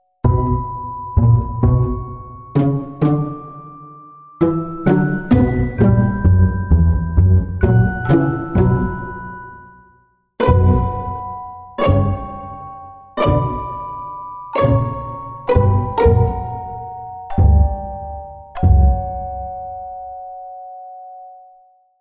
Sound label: suspense music